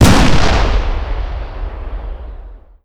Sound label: tower missile; Modern Warfare